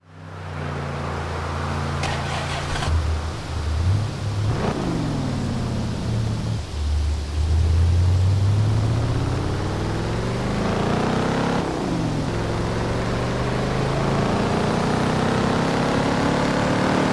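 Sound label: saleen s281, car; TDU-1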